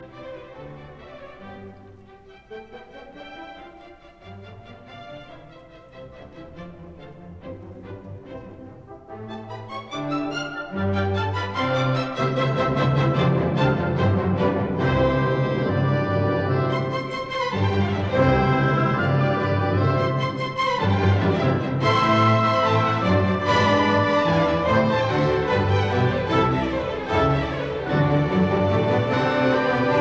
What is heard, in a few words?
Symphony in C major Adagio Allegro molto e vivace; Op 21; Bruno Walter